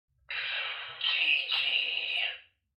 rrrrrrrrrrrrrrrrr

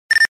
chirp

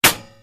bullet impact

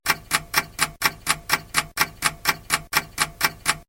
clock ticking

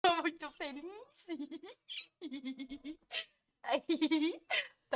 hihihihihihi

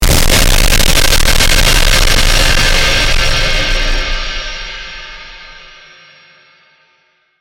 laser destroy

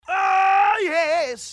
ohhh yehhhhh

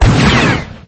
bounty hunter rocket sound; lego star wars

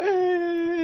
eeeehhh

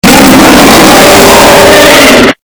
roar warning loud; mega smg5

